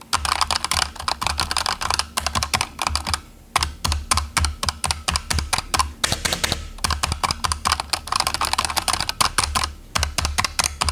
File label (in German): razer linear rot lautstaerke